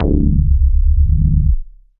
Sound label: Squelchy Bass; Instruments; Brooding Bass; Ableton; Mood Reel